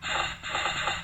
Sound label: trap; Magic-Tower